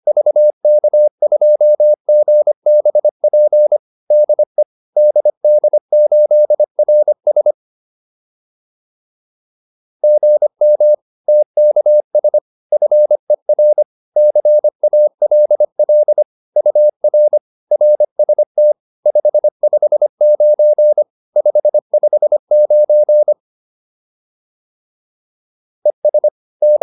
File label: QSO, advanced practice files